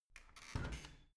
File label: washing machine open soft